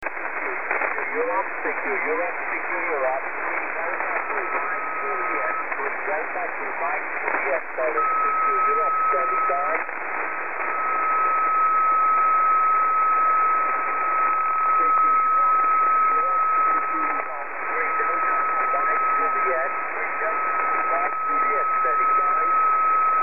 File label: 3798 khz